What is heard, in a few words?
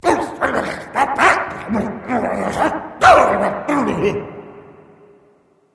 grumble; Smite; awilix